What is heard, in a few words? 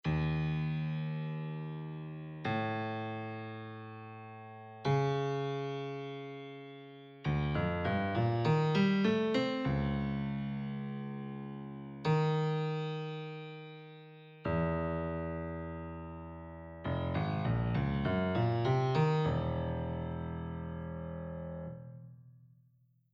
grand piano driven